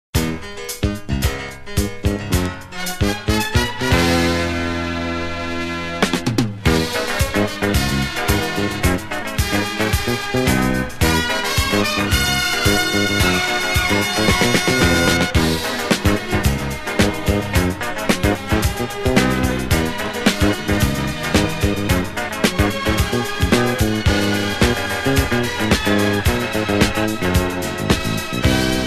ringtone pop

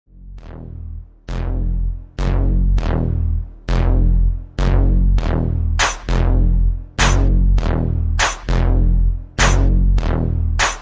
instrumental

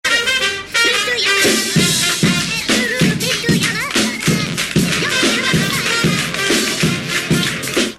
indian band; vodafone

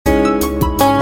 soft sms; ringtones

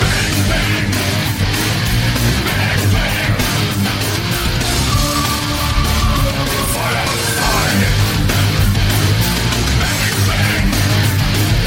Groove Hit

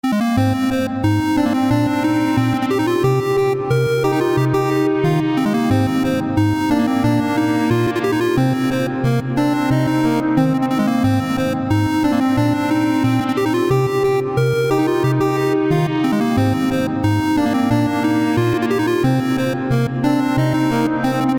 8bit Ringtone

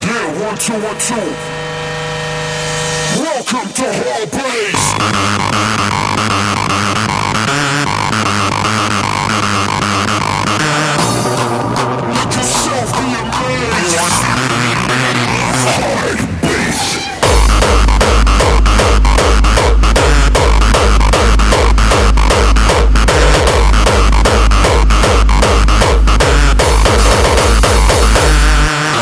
hardbass

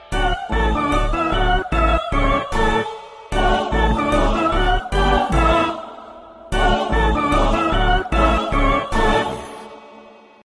Is Calling Inspirational

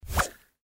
twitter new tone

